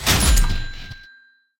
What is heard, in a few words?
add Money; hellskitchen